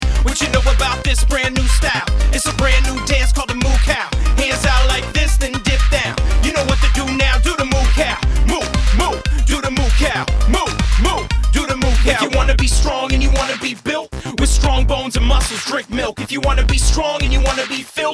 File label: Moo Cow Song